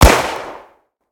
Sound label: thompson, Guns Fire